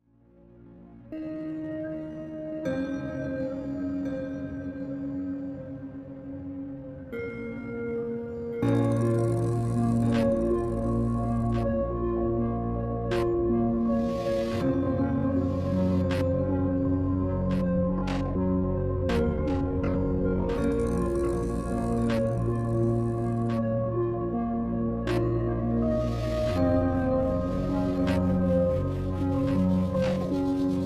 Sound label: Relax The Dreamy Atmosphere Electronics superSLOWed